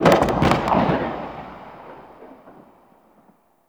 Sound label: Gas Dist Cloud Explosion; Warframe; Corpus Gas City; Ambience